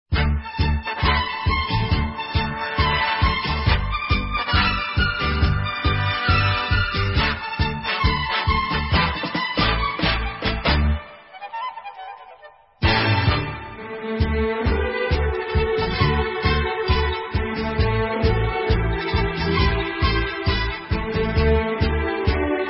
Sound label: Tango